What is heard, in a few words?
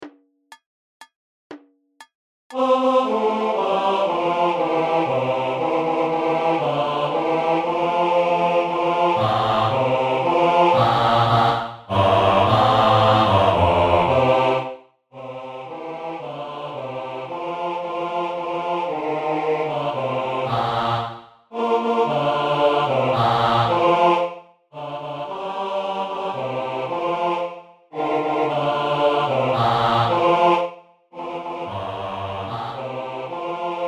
Bass; Aber ja nu nit z'gly; Schweiz